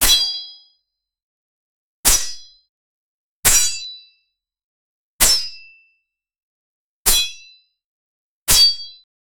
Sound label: fast sword slash sound; mono